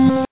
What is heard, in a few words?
error; Eric's TelNet98